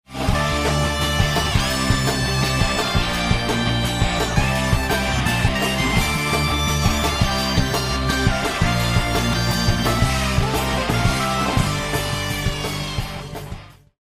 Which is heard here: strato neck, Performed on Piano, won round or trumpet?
won round